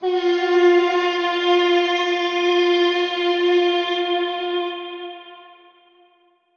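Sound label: Echo Processed, alarm siren samples 125bpm; musicradar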